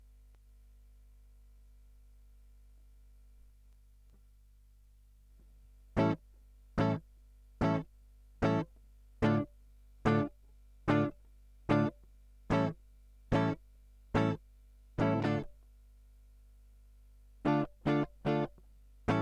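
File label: Guitar Line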